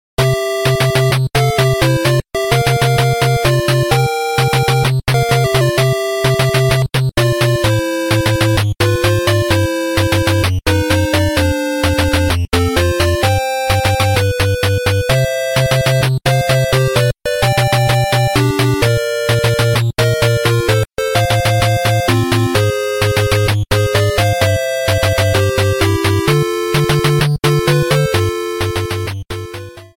Ending; SMB2

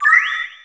Attack; PM

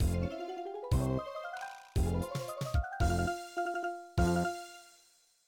Win; Floor 4-Plus

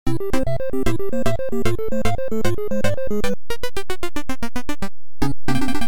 Clear Theme; DK3; Arcade; Yellow Greenhouse

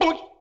Vocal Boink

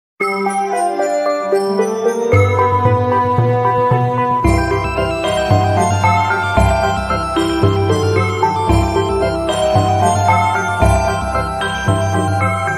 Underwater; SMM; SMB3

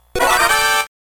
Time Bomb Ticks! Player 2 Start

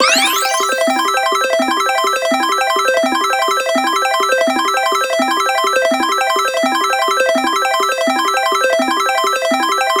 Pinball Item Roulette; MK8DX; Waluigi Pinball

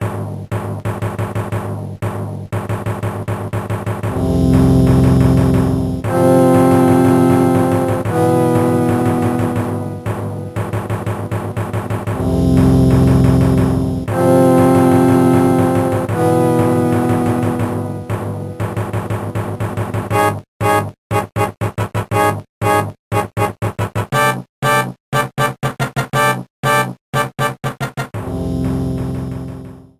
Airship; SMA4

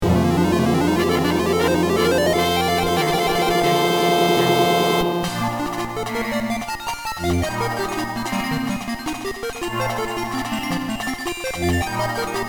Mini-Game Attack Completed; Attack 15

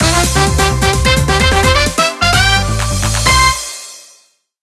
Mini-Game Win; Mario Party 8

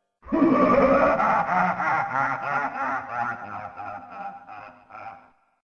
Laugh; Master Hand

